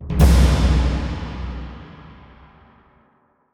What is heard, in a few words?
Epic Stinger; Blade & Sorcery